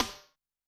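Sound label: Snare Ambience, Snare; Yamaha DTXpress-II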